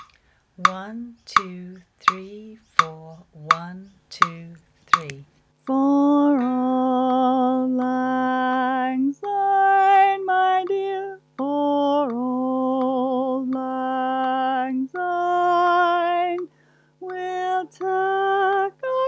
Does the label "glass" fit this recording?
No